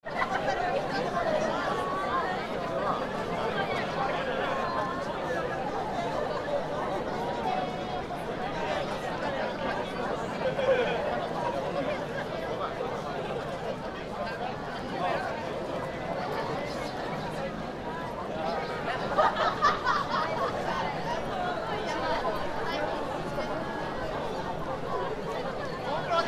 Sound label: entrance ceremony, Fukushima Soundscape; The Central Sauare of the Fukushima University; The entrance ceremony was held; Koji Nagahata